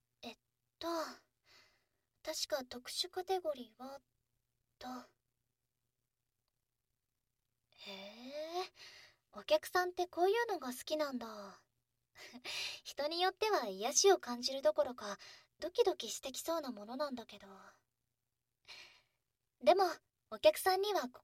吐息やキス音、オノマトペ; Cure Sounds

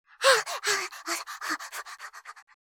ハフハフ(熱い)

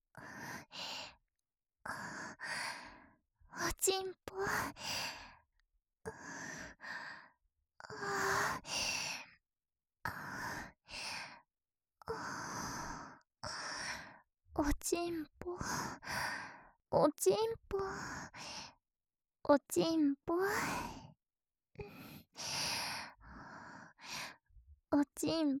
喘ぎ; おちんぽ

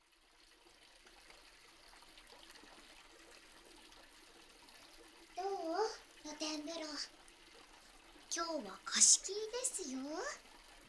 露天風呂とシャンプーと炭酸

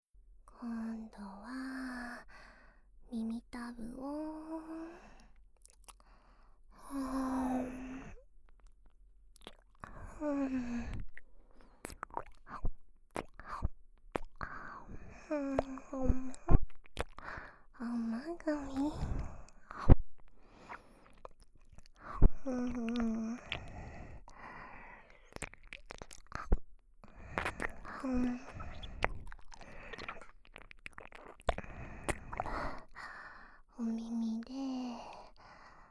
耳たぶはむはむ 右; オナサポループ

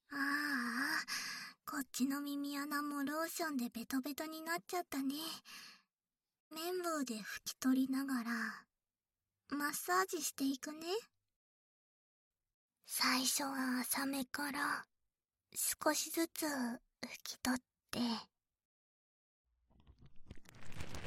【左】綿棒

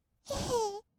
えへー, kawaii voice; 1200 kawaii voice!!; 色々なワンショット

